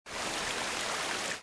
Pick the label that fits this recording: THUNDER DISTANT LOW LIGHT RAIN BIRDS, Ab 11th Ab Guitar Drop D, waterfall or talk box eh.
waterfall